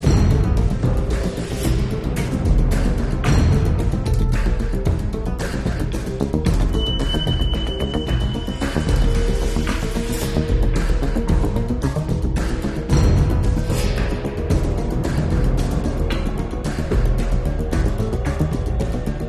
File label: loop Action